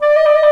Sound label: THE CLARINET